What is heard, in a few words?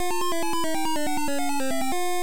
gameover; Juegos Retro; exolon